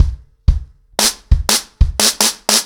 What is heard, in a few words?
90BPM, acoustic drums, drumloops